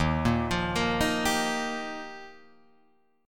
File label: Eb Major Add 9th, down Guitar Drop D